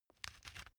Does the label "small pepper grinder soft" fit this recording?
Yes